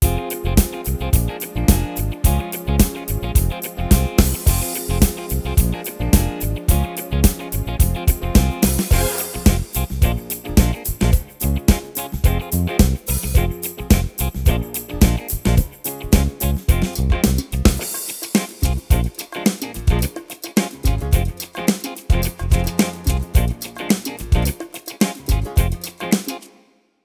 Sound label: style dance funk disco, yamaha tyros3